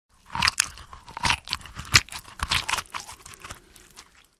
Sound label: Ravenous eating noise slurping and chewing